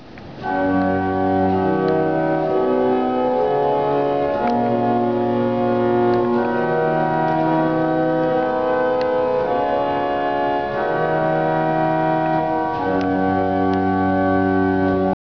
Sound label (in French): gambe, orgue